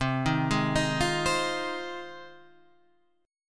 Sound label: Ab 6th C